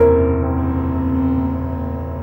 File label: Piano Chord